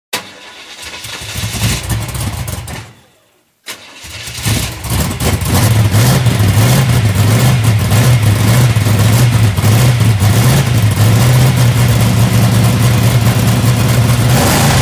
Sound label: Chevy Camaro